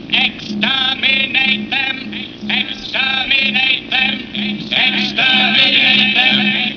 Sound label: unison; LOTS OFF DALEKS